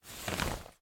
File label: cloth Remove; spellbound